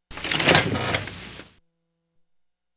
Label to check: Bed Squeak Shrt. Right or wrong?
Right